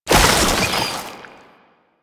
Proto Infested Babau Underground Attack Tentacle Burrow, Enemies